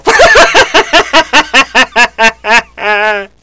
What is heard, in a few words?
cheer; zmParadise